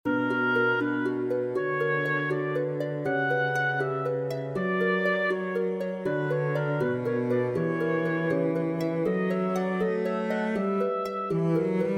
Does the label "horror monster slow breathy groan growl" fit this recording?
No